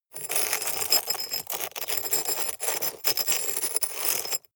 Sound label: scrape stone shard hard